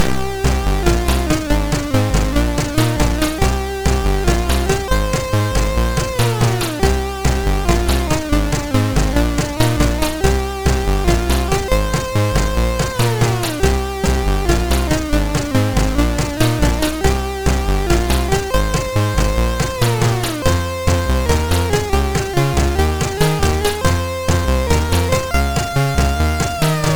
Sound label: sid MOS6581R3, GAMES; Baal